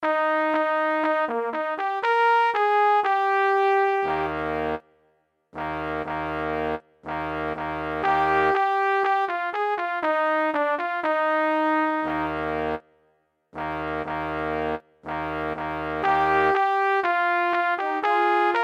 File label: Fanfare